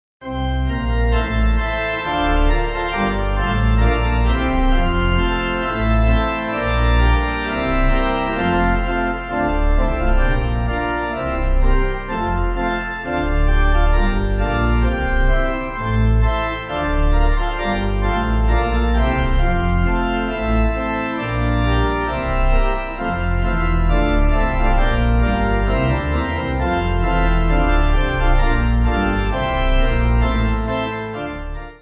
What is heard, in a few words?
Pipe